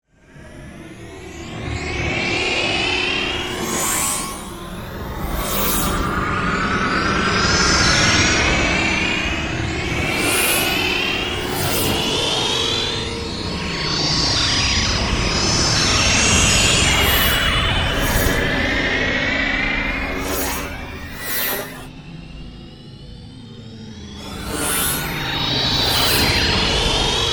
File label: Spaceship Pass Bys, Space, Traffic; Spacecraft; BlastWave